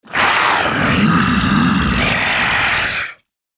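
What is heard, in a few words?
alert, zombie male; nmr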